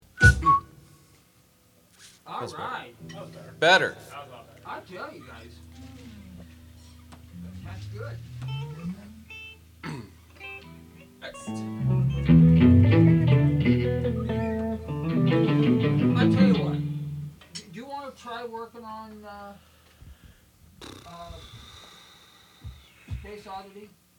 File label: Vocals and Background